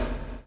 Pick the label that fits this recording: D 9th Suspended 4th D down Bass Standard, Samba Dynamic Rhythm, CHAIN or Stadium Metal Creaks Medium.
CHAIN